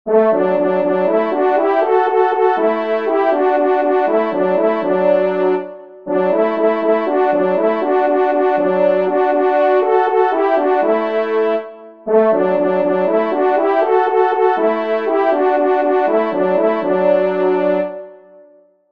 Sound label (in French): Depart pour la chasse Duo